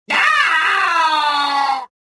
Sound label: death, rodian